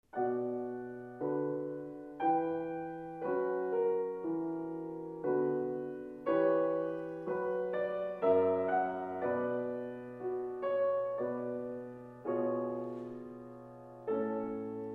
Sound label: Concerto for Trumpet in D - Grave; Telemann; Solo Trumpet